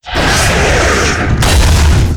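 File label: wolf attack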